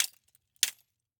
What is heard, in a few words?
Rollerskates, old fashioned, all metal, wooden wheels, clash, strike, clatter, ping, double; Eiravaein Works; Vaeyan IV